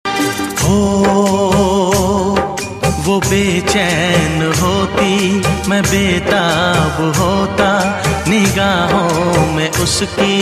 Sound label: Old Ringtone